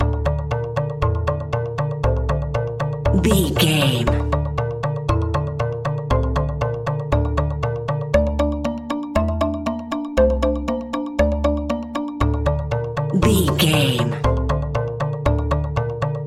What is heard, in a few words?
nervous